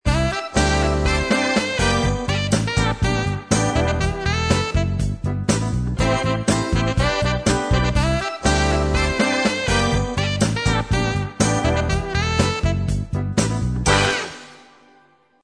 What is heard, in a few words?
Motown Sound